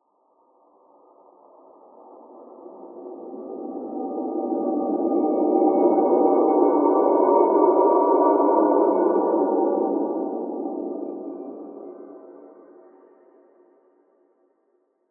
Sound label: Whoosh begins with dark sigh then synth wind gust with mettalic resonances; and quiet